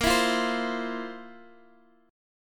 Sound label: F Major 7th Suspended 4th A sharp